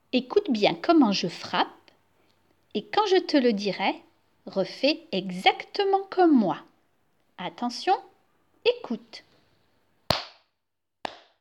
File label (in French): rythmes